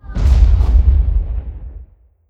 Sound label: bombardment explosion; weapons; Stellaris